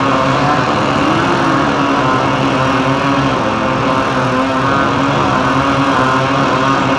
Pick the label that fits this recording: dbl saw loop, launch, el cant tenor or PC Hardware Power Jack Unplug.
dbl saw loop